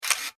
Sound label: technology camera shutter release nikon f4; Electronics; McKinney Sound